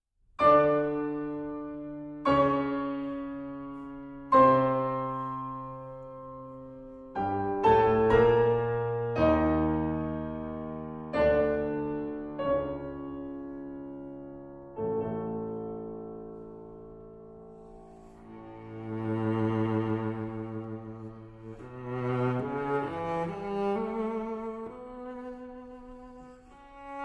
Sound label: Adagio for Piano and Cello, Live; The Limpid Stream, Op. 39 4.; Arr. Atovmyan